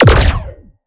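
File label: Sci weapon Shot Medium Laser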